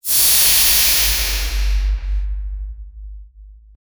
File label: sound of curse mark activ, energy effects